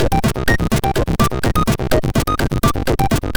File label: Jump, MOS6581R3; hvsc